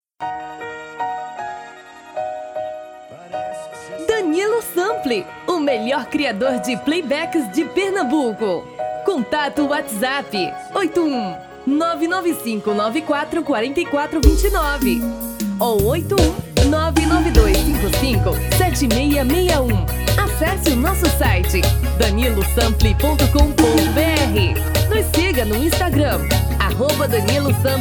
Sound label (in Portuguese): Piseiro